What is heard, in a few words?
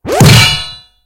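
tank ricochet; tanks; War Thunder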